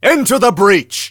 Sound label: attack; Awesomenauts